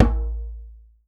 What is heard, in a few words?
djembe, ethnic set; Voice Crystal - Steve Reid Definitive Percussion